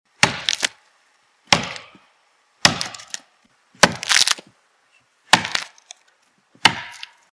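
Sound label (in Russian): рубка дерева топором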